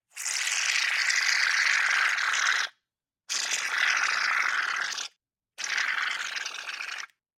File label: Aerosol Can, Shaving Cream, Squirt, Watery, Long, x3 - bubbly and thin; Bathroom; Airborne Sound